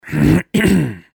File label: clearing ones throat